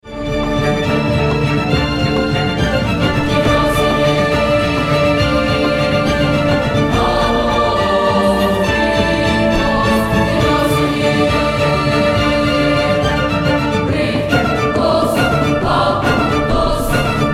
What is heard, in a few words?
Orchestra